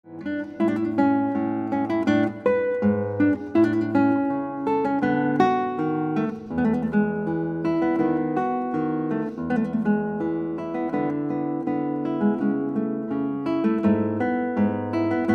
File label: Guitar